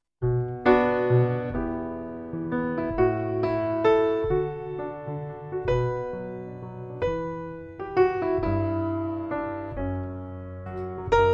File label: piano